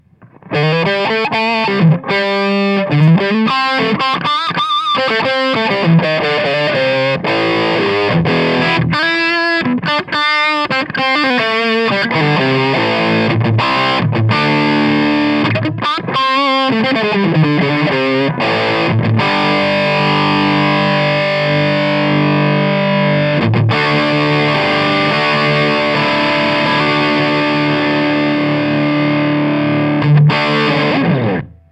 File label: 6watt el84